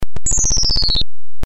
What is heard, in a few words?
noise